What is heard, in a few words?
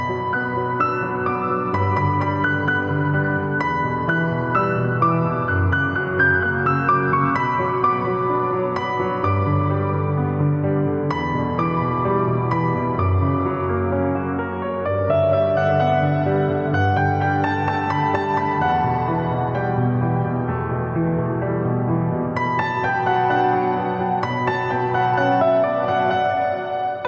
English Ringtone Instrumental